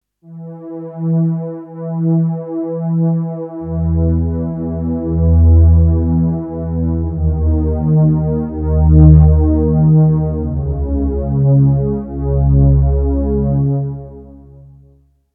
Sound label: dark strings